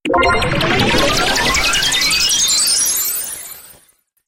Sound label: one Up; AsteroidPatrol3D